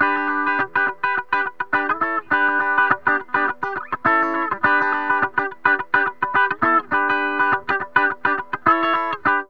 GTR; Zero-G; Funky Elements

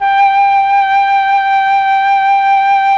FLUT; ILIO; Propeller Island Legendary M400

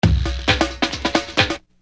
Beat, Hip-Hop Street Beats

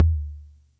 Percussion Computer Tom; Atlantex MPC-1

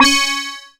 C#, BELL GONG, Synclav FM; Big Fish Audio

